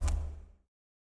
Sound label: UI power wheel scroll